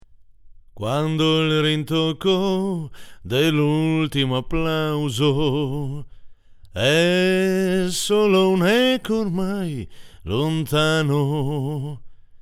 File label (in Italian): canto pre scheda; Amplificatore